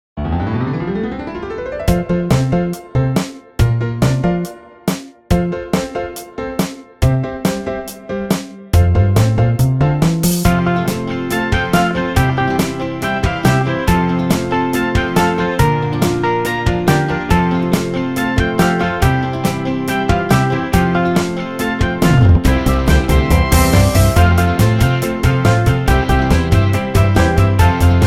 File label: backing music